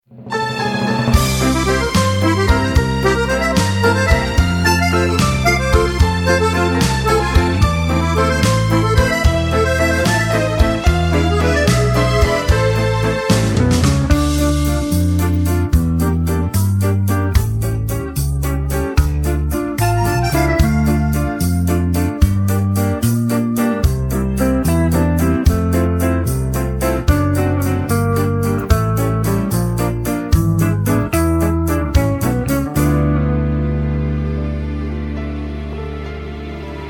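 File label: instrumental, с диктором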